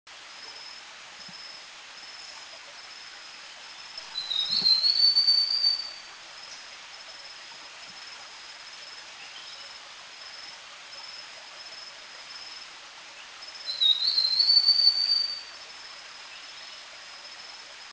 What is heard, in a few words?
Grey-throated Babbler; Stachyris nigriceps; Thailand; Krung Ching